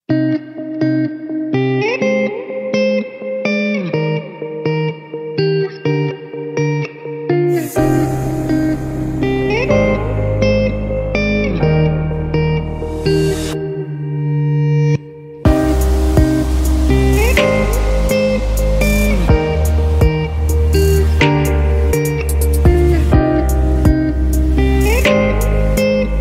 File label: Korean ringtone